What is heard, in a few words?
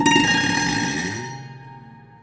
Prepared Piano; Battery 3 Sample Kits